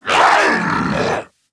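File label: die, zombie male; nmr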